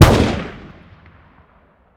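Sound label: weapon ar fire player atmo exterior; gmod; viper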